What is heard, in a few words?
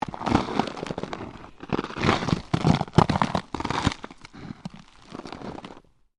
Ice Crackling Low Stereo, Ice movement, cracking and shifting; Snow; Weather